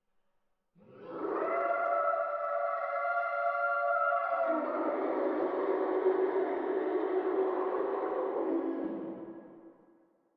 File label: Distant Ghost Howling